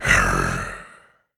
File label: zombie aware; DeadFright